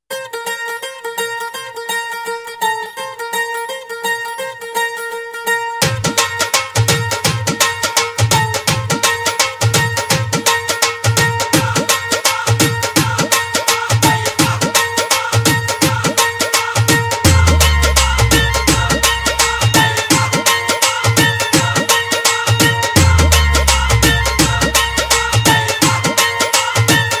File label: Punjabi Dhol